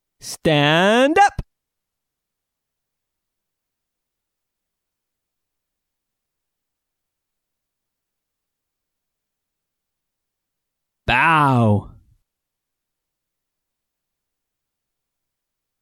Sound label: Genki Disco Warm Up